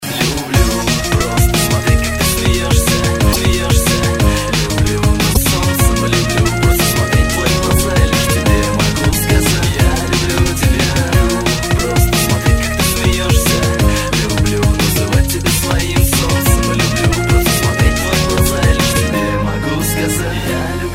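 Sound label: drum and bass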